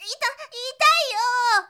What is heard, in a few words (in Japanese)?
shonen himei